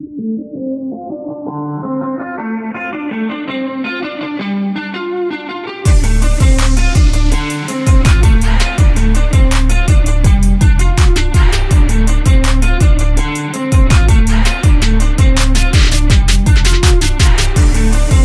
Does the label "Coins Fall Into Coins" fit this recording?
No